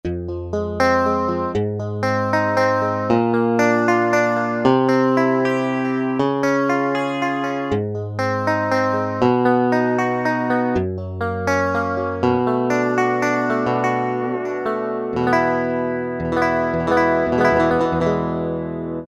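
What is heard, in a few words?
Clean electric guitar; Roland L-CDX-01 Rhythm Section Instruments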